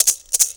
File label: MULTI RATTLE; Best Service; World Colours